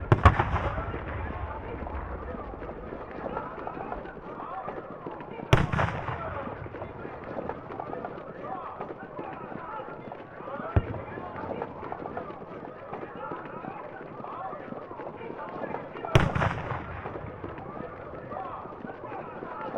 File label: Ambient Far Battle; beltion beyond ritual